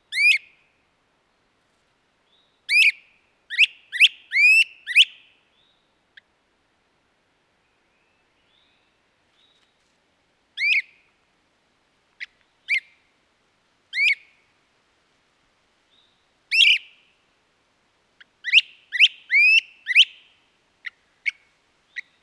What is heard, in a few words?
great crested calls, Great crested Flycatcher; Great Crested Flycatchers prefer breeding territories in open broadleaf or mixed woodlands